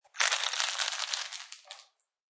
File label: Plastic Wrapper Crinkling; Plastic food wrapping or package such as chips or cookies crinkle sounds; Crinkling Sound Effects